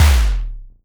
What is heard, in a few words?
SYNTOMS; Masterbits; Vintage Voltage; SQ+FX